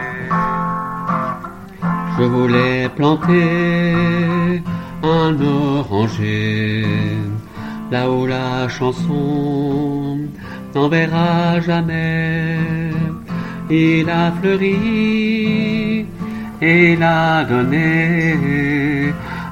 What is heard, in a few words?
Alto, chorale; Ballade